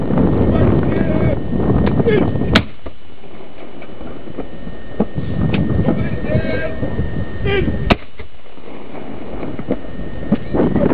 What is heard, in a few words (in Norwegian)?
salutt festningen